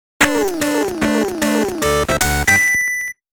Stage Clear; Amazing Penguin

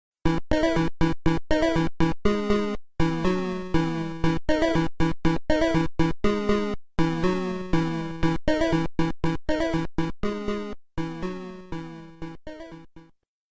Game Clear; Quest; puyo puyo game gear

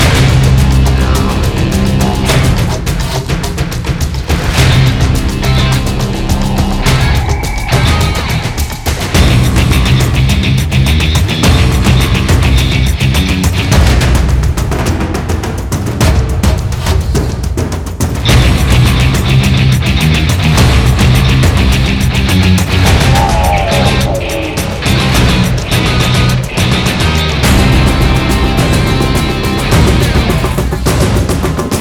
Boss Fight; Aztec Jungle

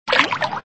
step Water; Super Mario 63

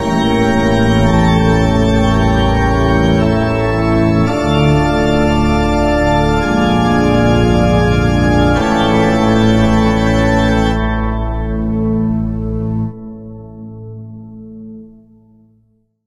Stinger Organ

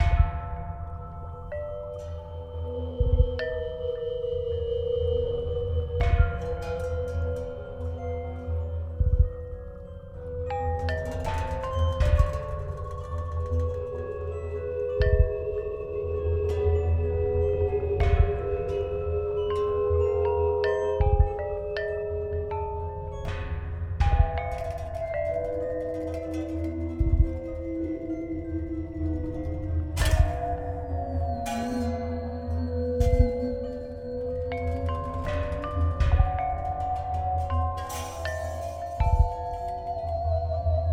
Music Gameplay State Neutral; Music Hideout4; H4 Level Area=Cave Lvl3

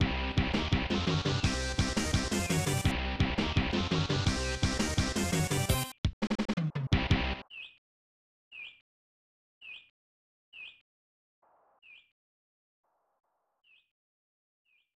Lose; Super Punch-Out

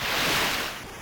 swim; super trench attack

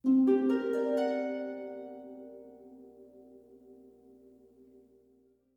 Success Chime Minor; The Eyes of Ara

